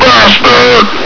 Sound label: pain; Alien Arena; marine